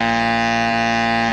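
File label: Electric Buzz Alarm Loop; buzz & hums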